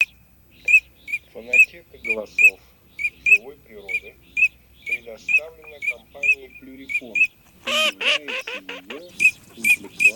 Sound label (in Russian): Чирок-свистунок Alzavola; plurifon